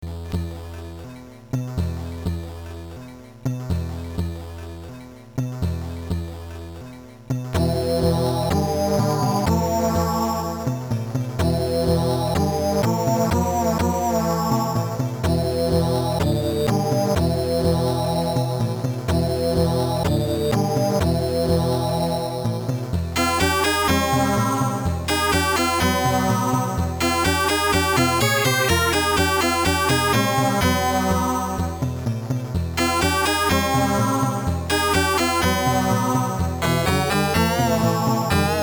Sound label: mellow camel funk; Ambermoon